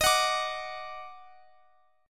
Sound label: Eb Minor Major 7th, down; strummed